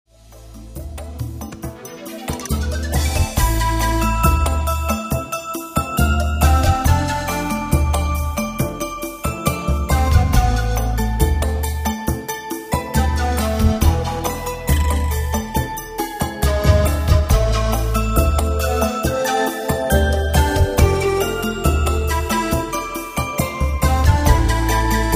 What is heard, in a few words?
Samba